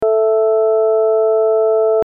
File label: bassoon, hammond drawbar